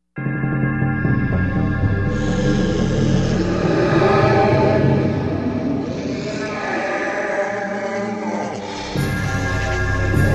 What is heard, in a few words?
Undead Victory; warcraft